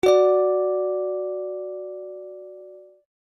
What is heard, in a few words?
string a tone fis48